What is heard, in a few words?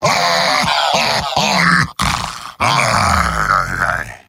heavy laughter big; vo; tf2; mvm